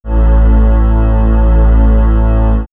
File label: Swirls, pads; X-Static Goldmine